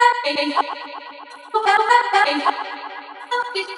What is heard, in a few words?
chopped vocals ping pong house delayed Bm 127